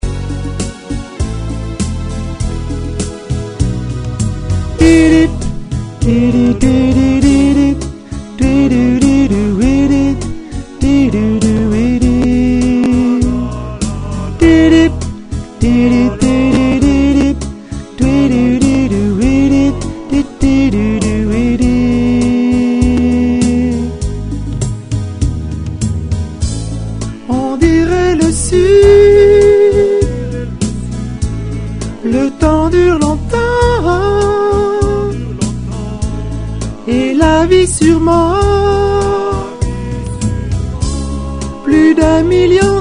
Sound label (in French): le sud intermede alti